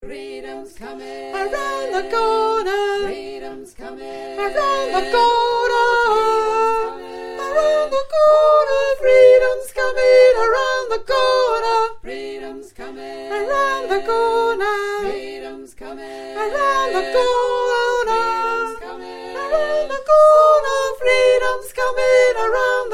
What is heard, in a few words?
freedoms coming mix sop